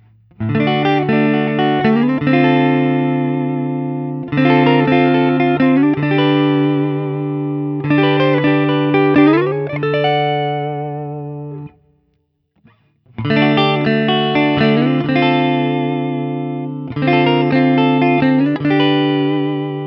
Guild X175 ODS100 D; Guild Newark St X175 Manhattan Special

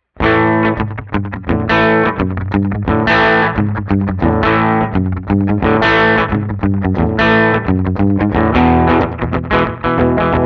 Guild Starfire III, Rockabilly; Westerly-made; Guild Starfire III from 1997

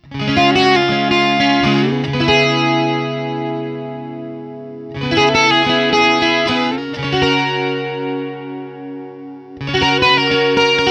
Crossroads Doubleneck 12 ODS100 D, D-Shape; Guild Slash Crossroads Doubleneck

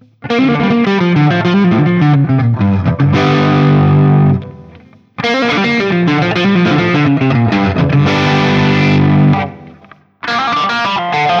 Guild Nightbird I JCM800 Riff

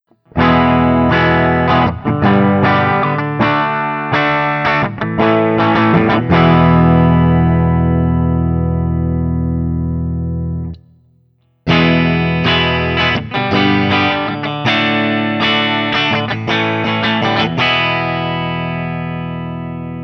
Guild S65D ODS100 Open

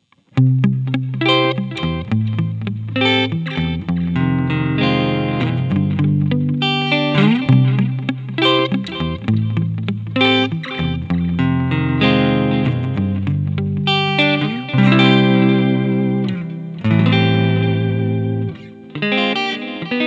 X170T, ’65 Bassman, – neck; Guild; Guild X170T Mars from 1999

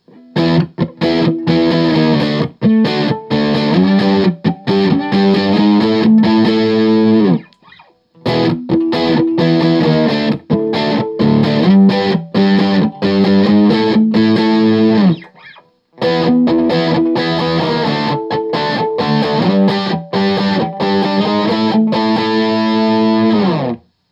Guild Starfire 4 J800 A Barre; 1998; guitar

